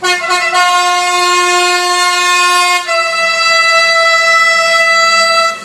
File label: TGV